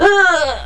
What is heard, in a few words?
death female; Odyssey Demo 1.1